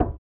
Knock; Ed Edd n Eddy; Adromulus Pack; EEnE

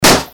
Ballon Explosion

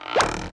Pop Up; Ed Edd n Eddy; Adromulus Pack; EEnE

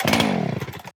Engine Start; Ed Edd n Eddy; Adromulus Pack; EEnE

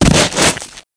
Body Impact; Physics

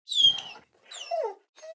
Dog - Whimper; Ed Edd n Eddy; Adromulus Pack; EEnE

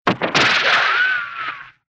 ricochet, weapons

